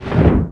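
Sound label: destruction FAIL, magic; The Elder Scrolls III - Morrowind; Morrowind GOTY GOG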